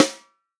snare drum, HIPHOP SNARE CHROMATIC

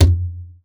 Conga, Session Instruments

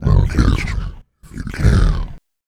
VOICE FX, Dance Vocals

